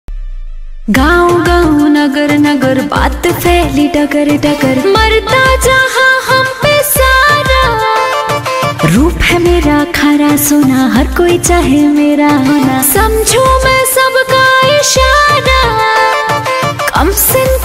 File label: bhojpuri ringtone